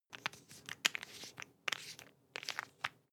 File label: toothpaste open rattle soft, bathroom; haus-garten; badezimmer